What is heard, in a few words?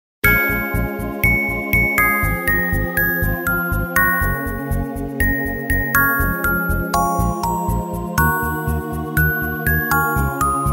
musicbox melody; ringtones